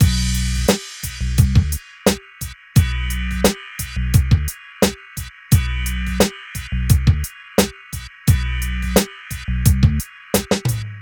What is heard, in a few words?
Hip Hop and R&B Drumloops, mix; Best Service ProSamples vol.01; AKAI